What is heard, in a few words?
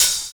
Open Hhat, Hihat; Equipped Music; Loungin House